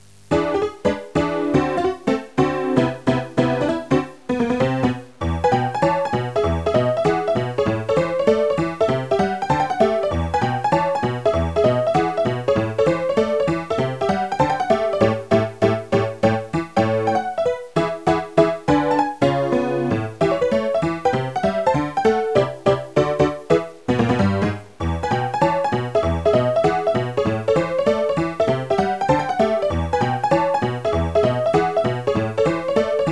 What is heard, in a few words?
bgm funny; eve bgm funny